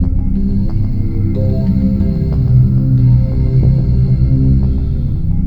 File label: phase; Best Service Dream Experience; LONDON PHASE; LONDON-TEXX